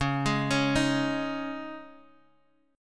C sharp Suspended 2nd C sharp Guitar Half Step Down, chord